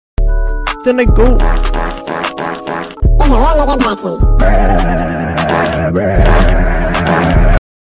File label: bruhhhh fart fart